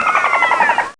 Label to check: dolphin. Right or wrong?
Right